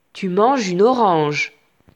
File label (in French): vocal